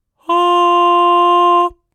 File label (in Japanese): 息もれのない裏声のロングトーン